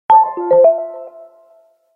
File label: Alert